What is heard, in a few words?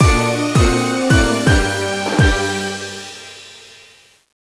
Orchestral Fin; Imagine Modern Dancer; Nintendo DS